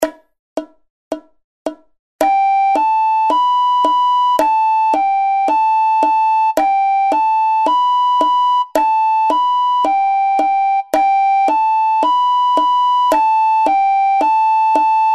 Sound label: gently sleep, recorder